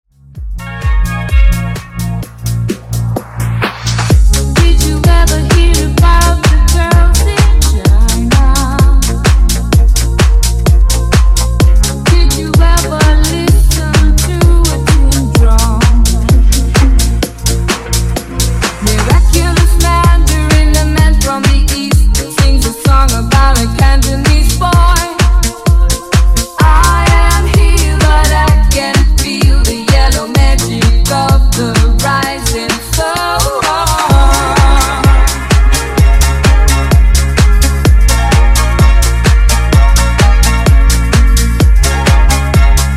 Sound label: Funk Retro Disco 128Bpm